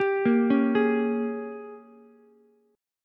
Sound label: G sharp Major 7th Suspended 2nd Suspended 4th Ukulele; 12,10,9,11; JGuitar